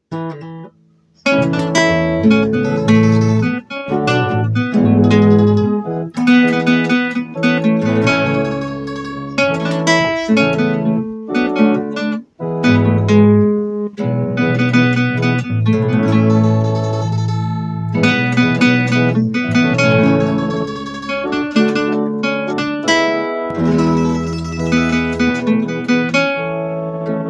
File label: RU glad Karaoke